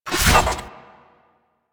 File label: Glaive Whoosh; Warframe; Weapons; Ghost Catcher